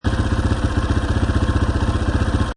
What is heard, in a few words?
Motorcycle Dirt Bike Idle Loop, Motorcycle motor loop